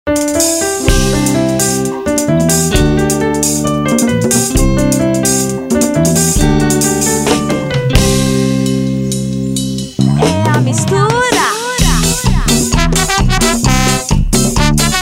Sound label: calypso